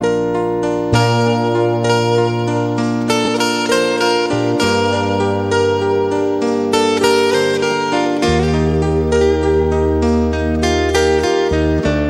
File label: peaceful love background music tone ringtone